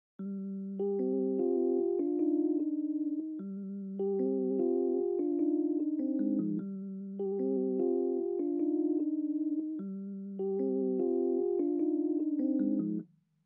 150 BPM C Min Keys; Cymatics - 2022 Melody Collection - Wavy; Ganja

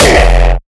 kick oneshot monster F; GhostHack

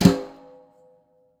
Maple Brush Flam, Snares; Drums; Ghosthack - Free Snares 2023

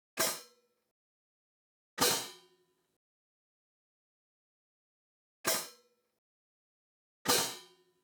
Drum Loop - 91 BPM Open Hat, Boom Bap; Dreamers; Cymatics - ANALOG EVOLUTION Beta

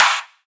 Future Bass Clap; Drums; One Shots

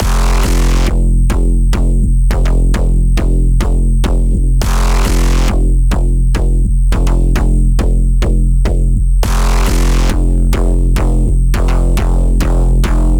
104 BPM F Min Bass; Cyber Collection; Edge Runner

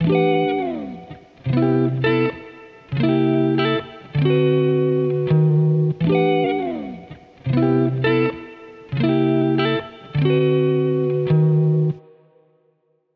Electric Guitar, 80 BPM E Min Wet; Lofi; Eternity; Cymatics; Lofi Starter Pack; Guitars

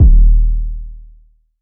808 D#; Cymatics; Oracle Sample Pack; 808s

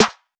Snare A; Drum One Shots; Snares; Cymatics; Eternity Sample Pack

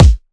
Riddim Kick; One-Shots; Ghosthack; Riddim Essentials Volume 2

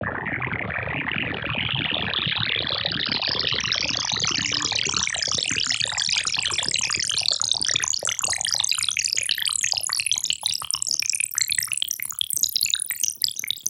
Riser Liquid; Riddim; One-Shots; SFX; Ghosthack; Riddim Essentials Volume 2

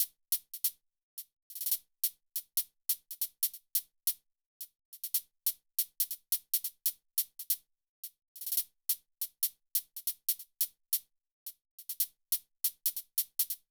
Drum Loop - 140 BPM Hihat; Loop Stems; Cymatics; Mystery Pack Vol 4; Birmingham

